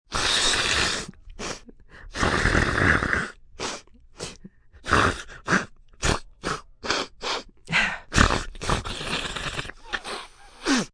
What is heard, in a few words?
Blowing nose, gross!, cold, sick, (human voice); Sound Effects:Human; Voice Box